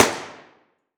Weapon Gun Rifle M16 Single Shot Interior Shooting Range; AdobeCC